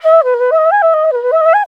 INDIA ALTO

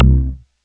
REZ KLIK BASS; Rubber Chicken Software Sample Bank

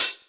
Hhat, Hihat; Equipped Music; Loungin House

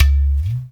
UDU; Spectrasonics; Heart of Africa

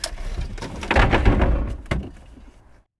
Ambient boom; neuro; InsideInfo; Real World Sounds

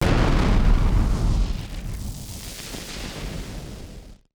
Party Popper FX

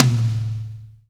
DRY TOM 4 L; Spectrasonics; Burning Grooves; BG TOMS

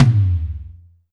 tom lo, Alesis DM5

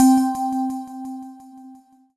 SYNTH Paperfoil Bell

